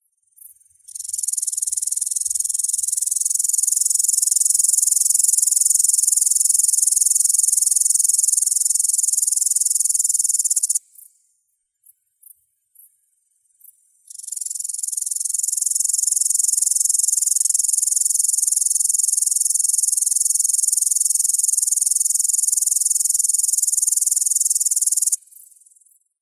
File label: Tettigonia cantans